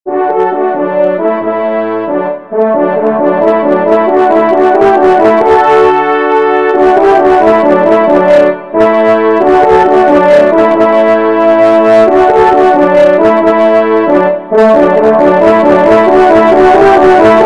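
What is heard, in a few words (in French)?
ensemble, trompe cors; La d'Arschot; Noury; Belgique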